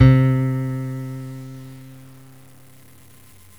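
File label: steel string guitar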